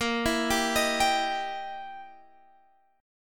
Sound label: E Minor Major 7th Flat 5th Bb